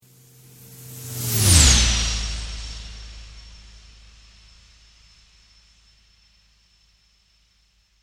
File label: Sweep Mechanical Slow